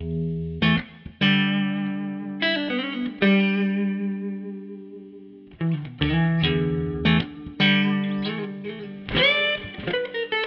Blues Em Electric Guitar Clean Bright 75

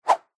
strike, Ball Bat Glove, baseball

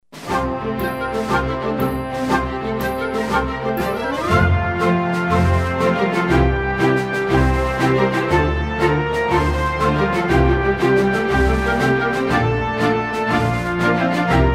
Music background pads

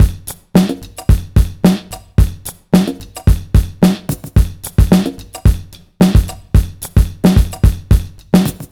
Club Dance Beat

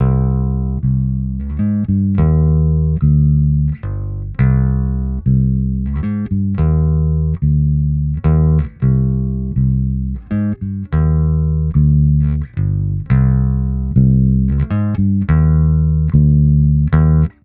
Blues Bass Dropping Cm 110

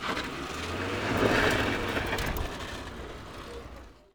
cars; vehicles

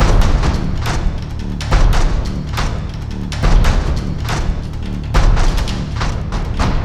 Drums Cinematic Energy Tones 140

